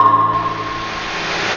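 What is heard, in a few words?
synth, Production Effects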